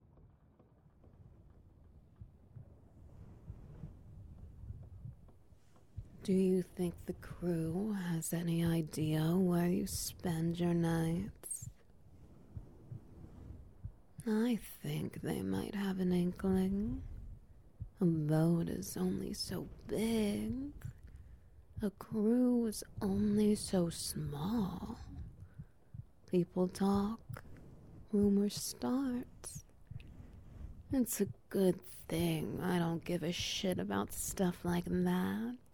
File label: Ambience, Heartbeat, Petting; The Captain's Best Kept Secret